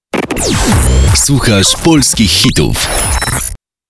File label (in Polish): JINGIEL